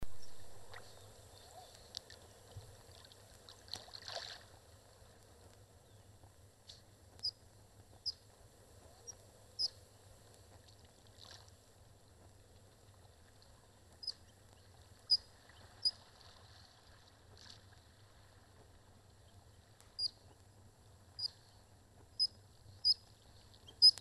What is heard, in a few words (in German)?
Otterstimme